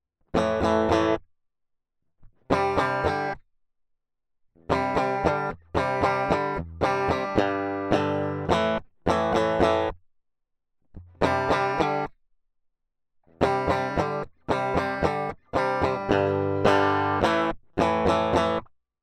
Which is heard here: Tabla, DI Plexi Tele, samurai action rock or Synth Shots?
DI Plexi Tele